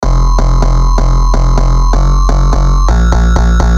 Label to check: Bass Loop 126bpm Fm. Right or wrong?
Right